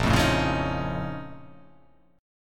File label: A sharp Minor Major 11th